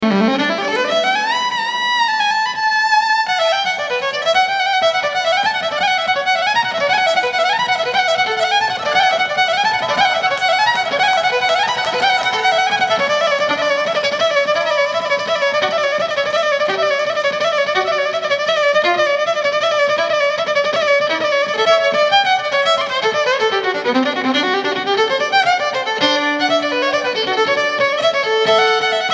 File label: Fiddle Intro